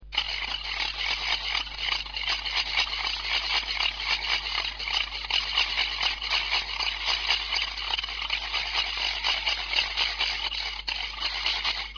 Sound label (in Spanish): carraca